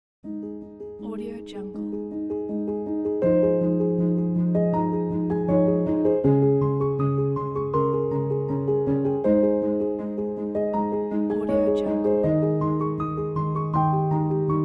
a gentle piano

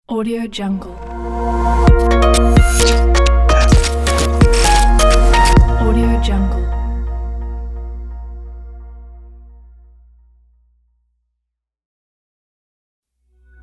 stylish opener logo pack

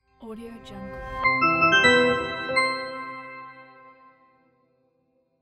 logo hi tech; amroyaltyfreemusic

